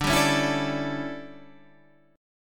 D Major 7th Suspended 2nd Suspended 4th, chord

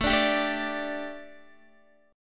A 7th Suspended 4th Guitar, chord; A7sus4 strummed

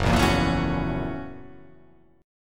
F sharp 7th Sharp 9th

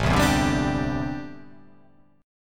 A Minor 11th A, Chord

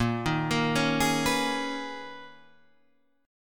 B Major 13th A sharp, guitar

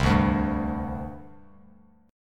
C Suspended 2nd, C, down, Bass Standard; Csus2 strummed; Csus2 chord

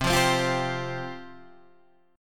C Suspended 4th C Guitar Drop D; down; chord shape

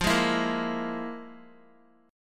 F Minor Major 7th Double Flat 5th Guitar; FmM7bb5 strummed; x,8,8,9,10,x; 4F,4A,5C,5G,5C,6E

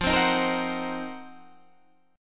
C Minor 7th Flat 5th F, chord; Cm7b5/F; strummed

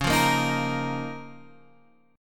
C sharp 6th Add 9th C sharp down Guitar Open G

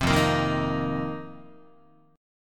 F 7th Suspended 4th F down Guitar; strummed; JGuitar

